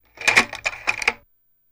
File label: Slide Projector Single Change; movie & projectors